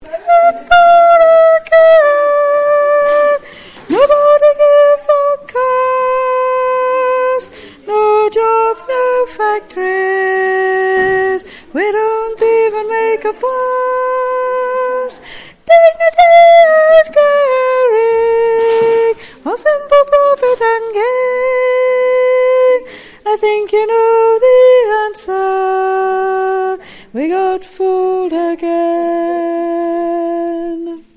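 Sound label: soprano chorus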